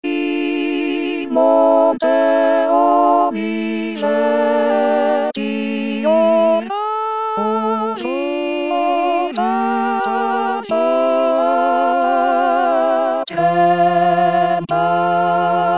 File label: in monte tutti; 2016 vox maria